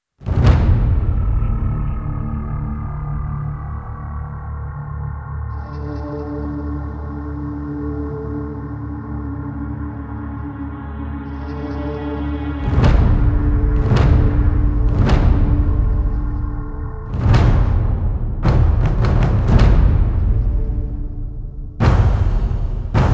taikos with drone

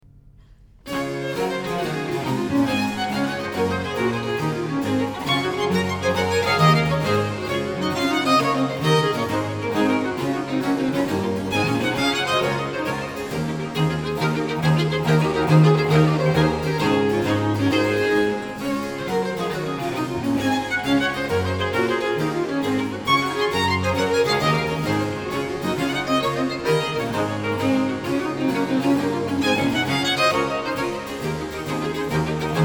gigue; johann pachelbel; canon and gigue

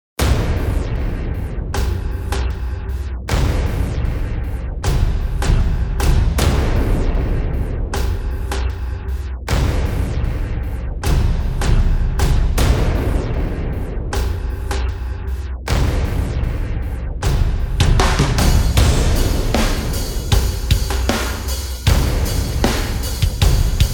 drums

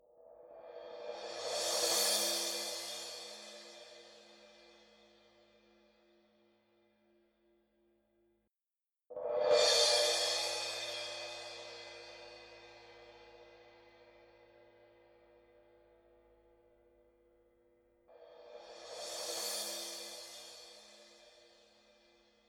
sfx cymbals; Blood Money

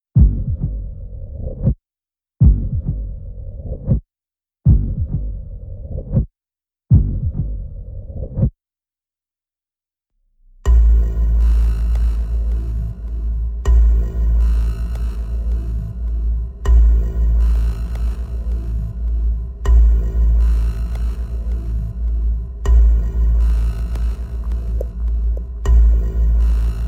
static discharge no pads

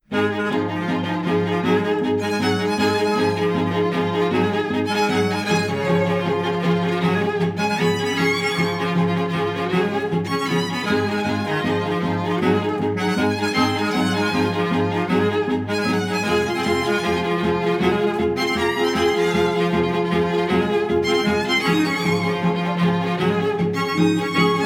bass clarinet and string quartet

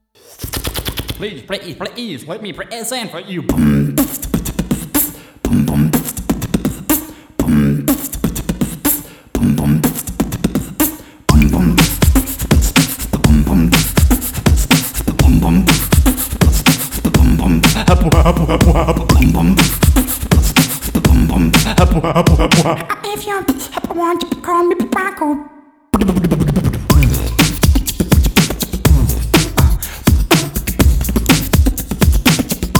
beatbox

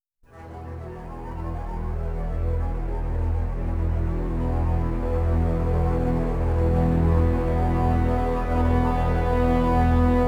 drone

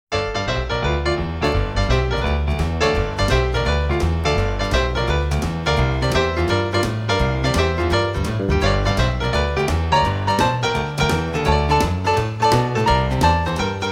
piano solo

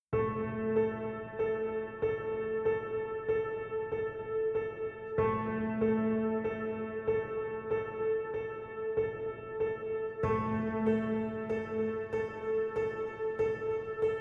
persistent piano a

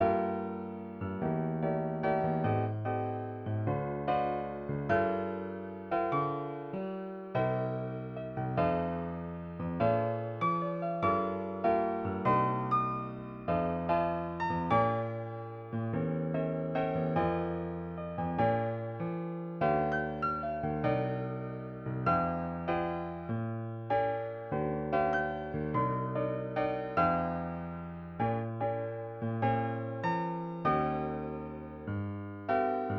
romantic wedding piano music

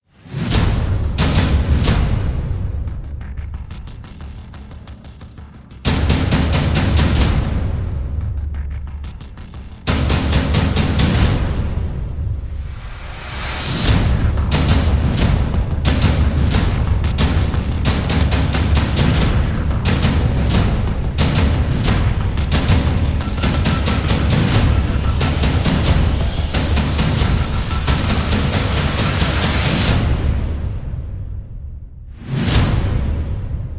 trailer backends lockdown perc

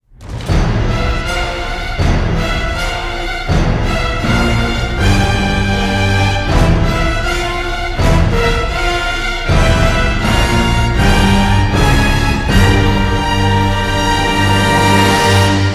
no choir

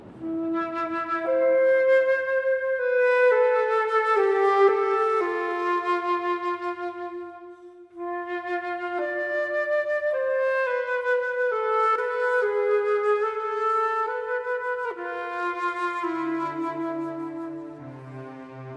solo flute

